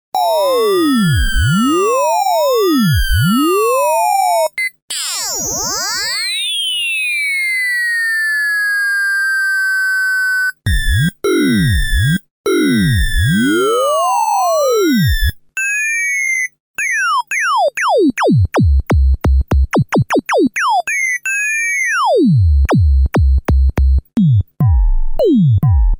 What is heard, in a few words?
yamaha an1x sounds, fm